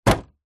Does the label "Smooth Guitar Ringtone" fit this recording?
No